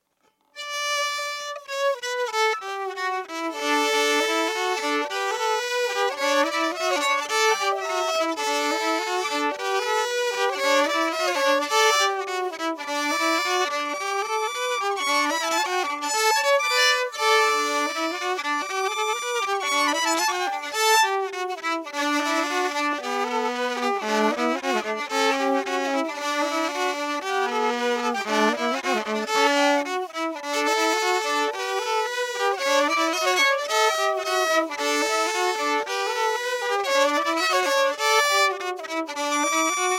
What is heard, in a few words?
duet, violin